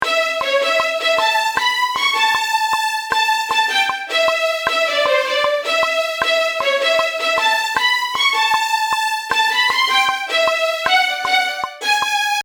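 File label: Bridge Melody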